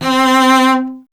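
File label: strings cello C; Miroslav Vitous; Solo Instruments VOL-1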